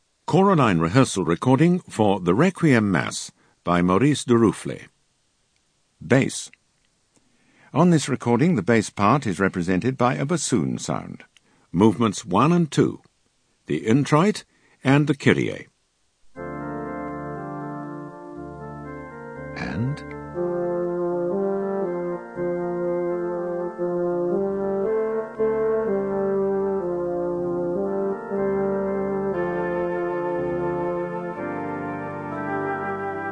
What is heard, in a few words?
Bass; Durufle Requiem